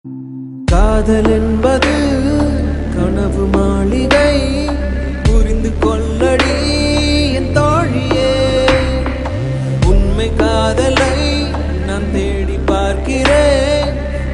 Sad Muzic; Mankatha

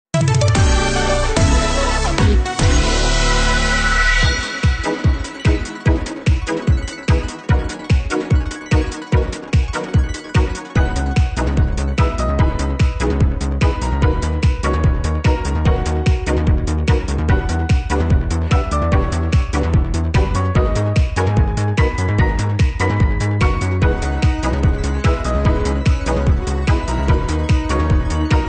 Fanfare; FF13LR

